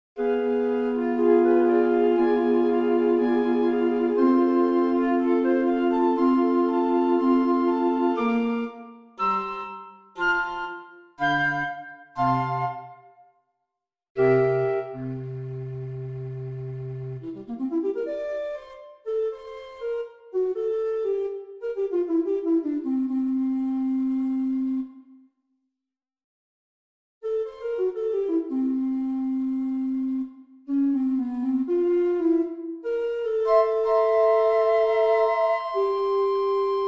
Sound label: flute quartet; FUCIK; The old grumbler